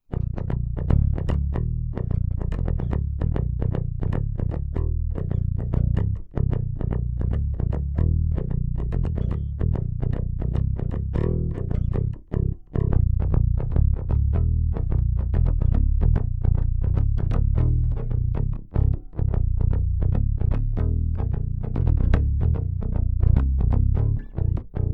Pick Mittig Hals Brücke Dann Full Boost

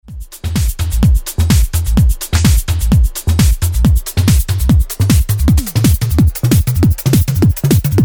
Pitchen ohne Key Adjust

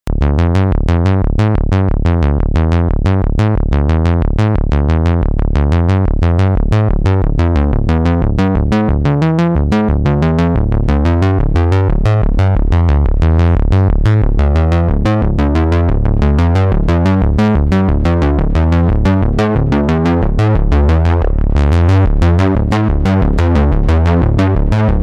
synthwerk bass sequenz

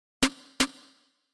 Snare bearbeitet

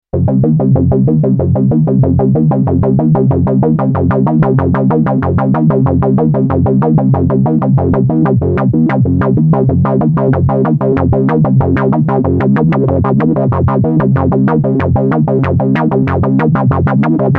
Bassline mit LFO

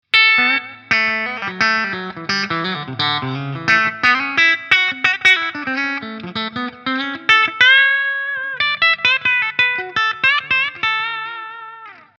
Steg CS clean

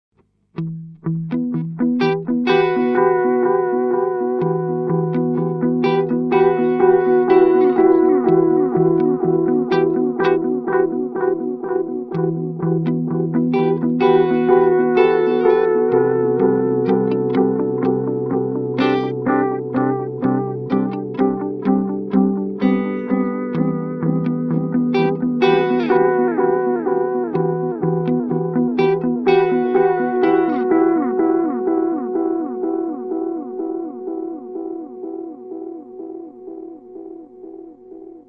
Seymour Duncan Vapor Trail Long delay mit Modulation

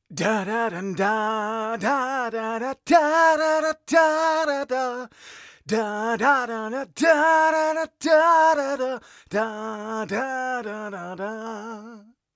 Vocals leichter comp

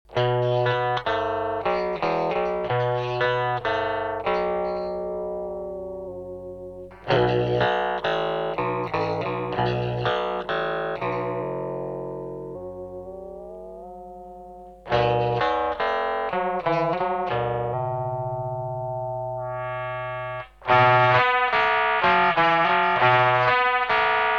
Ring Mod unterschiedliche Trackings; Red Panda Radius

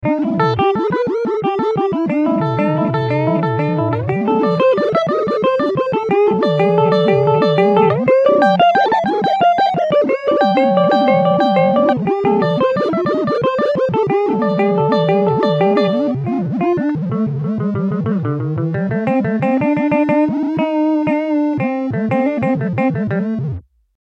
Synth Sinus Chaos

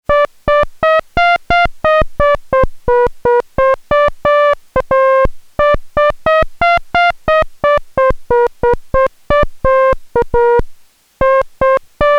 Melodie

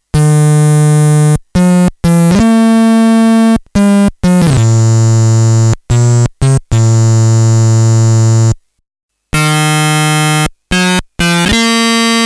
Klangfarben

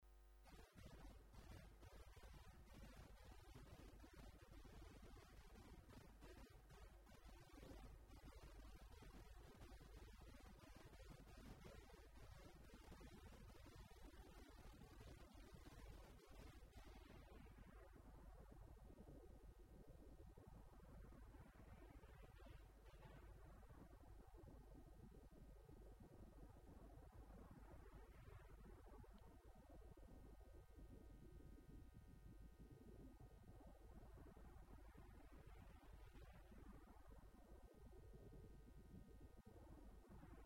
Bass mit Hall

